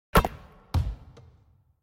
投中; 战中; nba

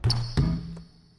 未命中; 战中; nba

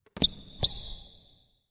摩擦; 战中; nba

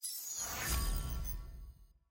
战术 主页入场; nba

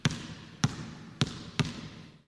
拍球; 战中; nba

